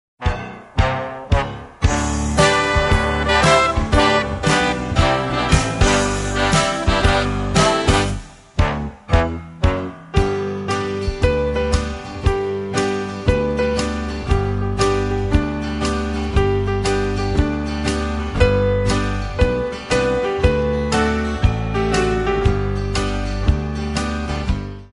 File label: what a miracle instrumental